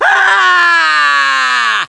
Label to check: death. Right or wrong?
Right